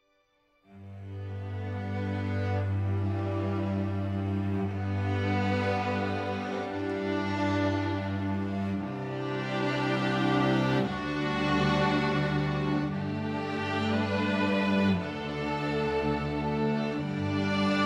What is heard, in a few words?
Hallelujah strings excerpt